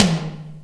hi tom drum single hit d sharp key, tom drum tuned to the D# note